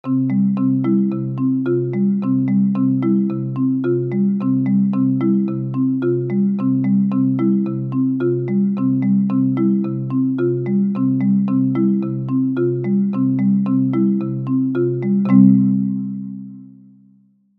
No Melody, ukulele; Rainbow Ukulele

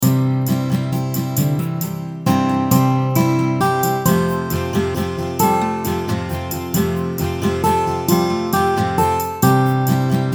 ukulele; Rainbow Ukulele

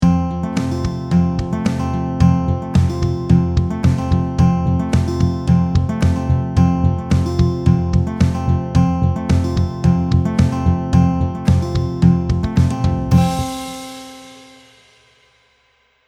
No Melody, ukulele; Rainbow Ukulele

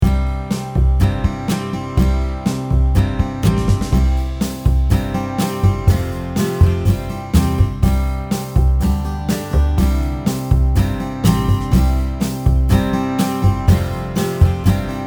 NO MELODY, ukulele; Rainbow Ukulele

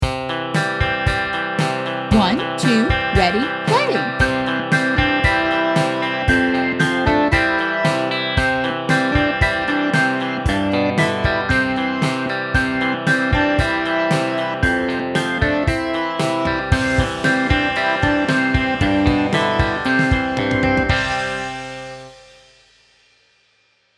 WITH INTRO, ukulele; Rainbow Ukulele

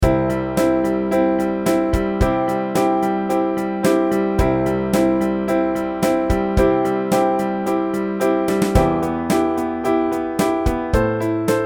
NO MELODY, ukulele; Rainbow Ukulele

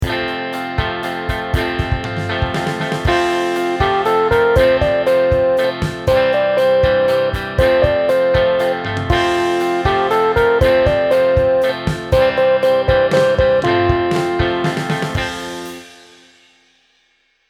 ukulele; Rainbow Ukulele

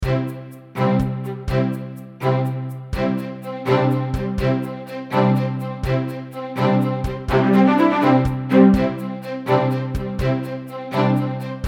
No Melody, ukulele; Rainbow Ukulele

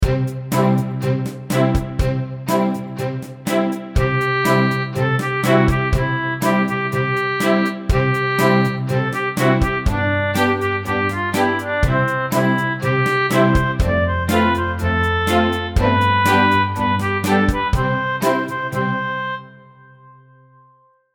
ukulele; Rainbow Ukulele

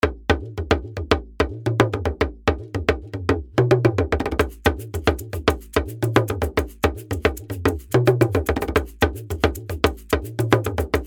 NO MELODY, ukulele; Rainbow Ukulele

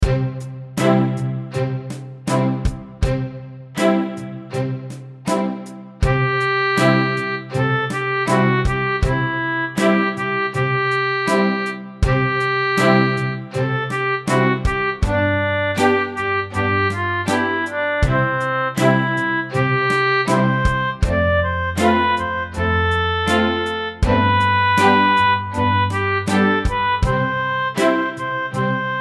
SLOW Tempo, ukulele; Rainbow Ukulele

